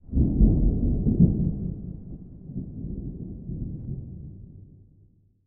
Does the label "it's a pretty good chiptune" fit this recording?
No